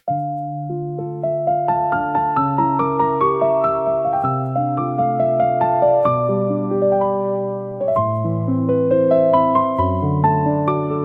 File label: calm piano